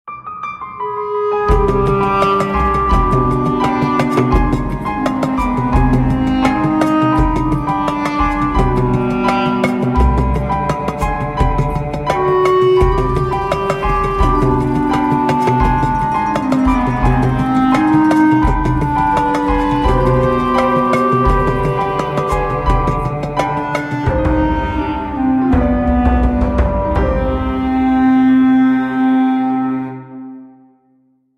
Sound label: Dance Music; mata hari windows gamerip 2008